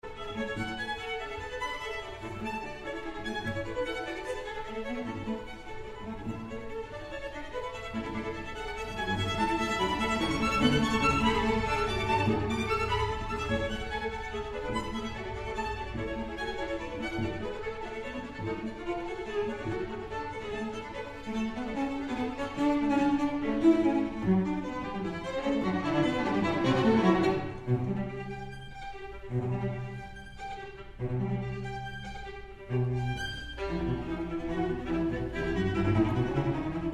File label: String Quartet in D minor Vivace; Jean Sibelius; Op. 56